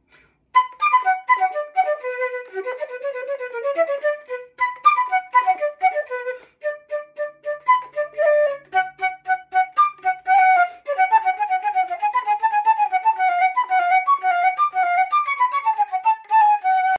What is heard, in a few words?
keyed flute; فلوت کلید دار